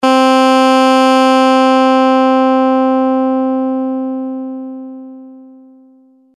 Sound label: puls cutoff lp